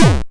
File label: YM Kick, DRUMS